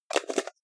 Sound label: jar plastic midsize close fast